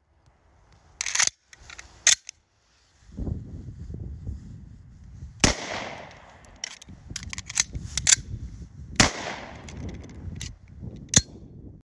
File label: Desert Eagle; اسلحه دزرت ایگل